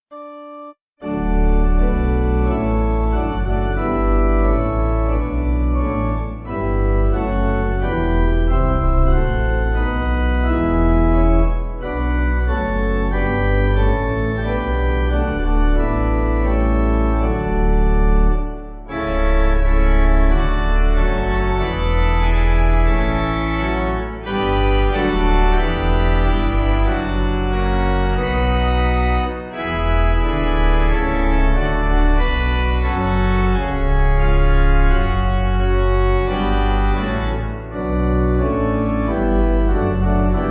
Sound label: Kyrie 9fold pipe